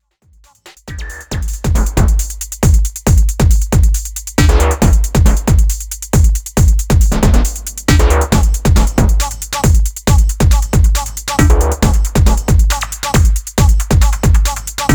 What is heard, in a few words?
Type Beat